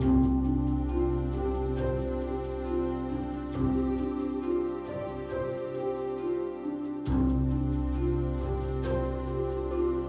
Mus Level complete Interstitial; Aegis Defenders

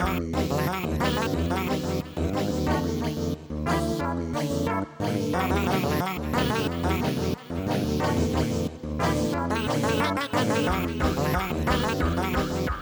180bpm; Goal Daddy

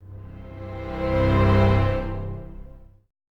Happy Sting; PS2; PSP

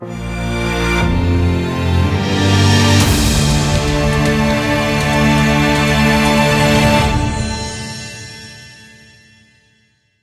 music failed; highrise heroes word challenge

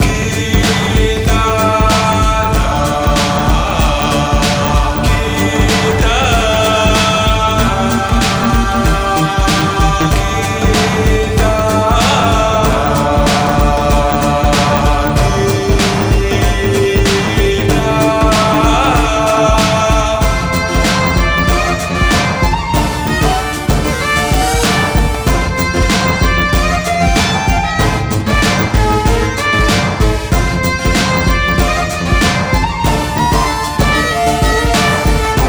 Character Jingle; Awesomenauts Ultimate Edition; Qi'Tara

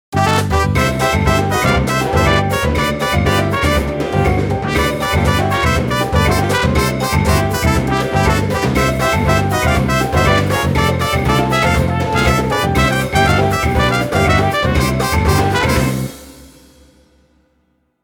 pachinko fanfare

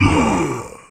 Grunt Pain; Cruelty Squad missing unused soundtracks

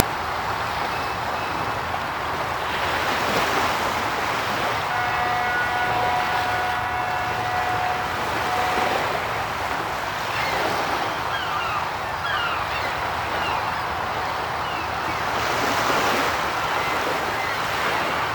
Ambience; Barbie Horse Adventures: Riding Camp; PS2; Wii

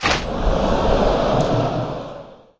Football Recieved goal Sfx

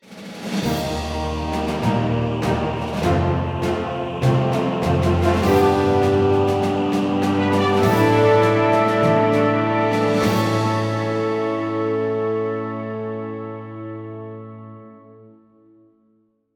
mission success; Destroyer The U-Boat Hunter

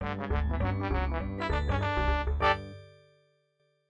MIDI Animation stings

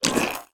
Feedback aspire

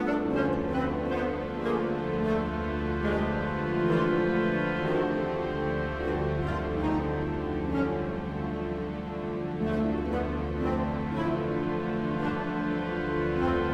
Deception 50bpm Loop; Music Dialogue

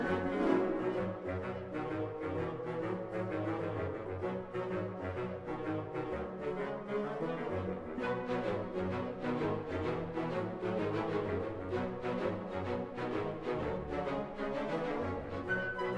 Music Short Punctuations Joyful 128bpm Loop; asterix obelix slap them all 2

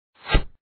Heavy Throw; gamerip; empires of arkeia

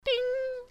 Coin; Enough Plumbers 2

